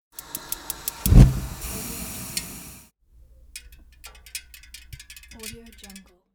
gas water heater; edgarvart

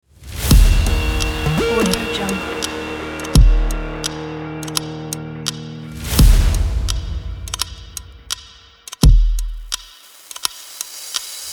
for powerful sport beat

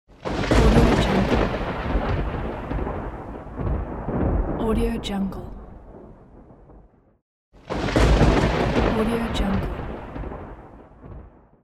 thunder

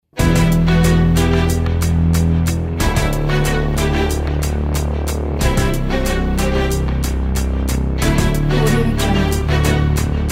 epic action

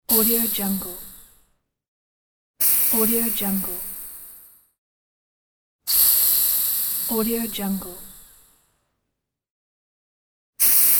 air hissing

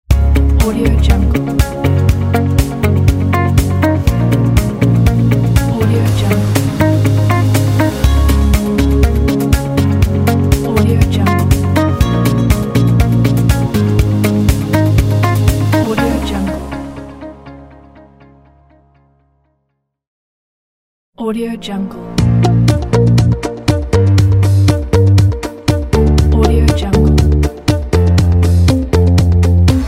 inspirational motivate ident pack

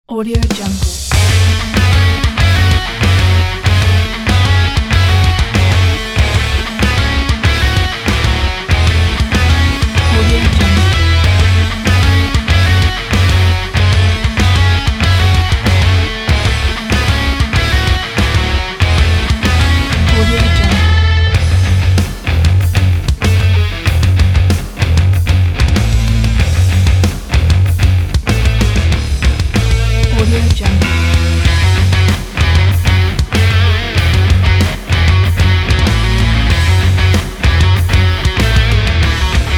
nu metal

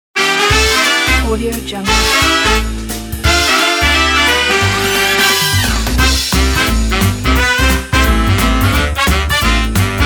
big band entertainment

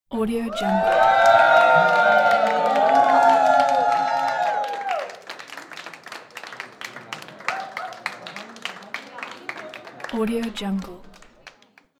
party crowd cheers and applause

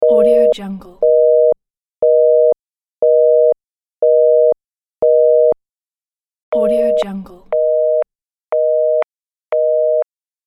call progress tone

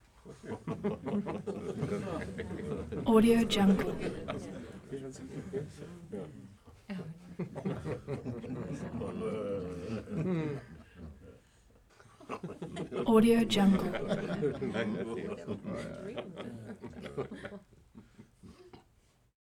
group laugh

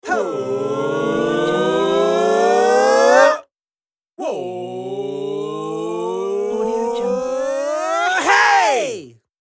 rising vocal

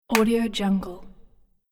slap smack sound

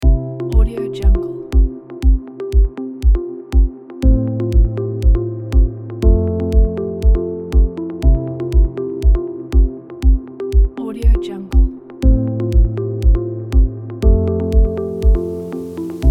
minimal development

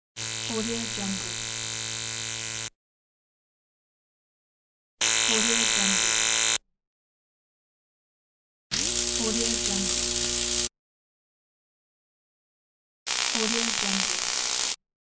electric arc; ivlior

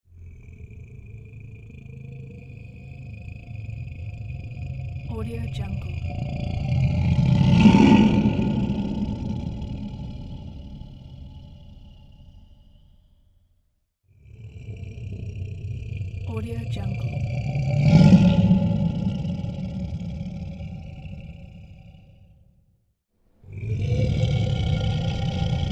cave monster growl

